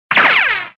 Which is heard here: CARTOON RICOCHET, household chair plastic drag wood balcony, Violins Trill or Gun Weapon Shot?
CARTOON RICOCHET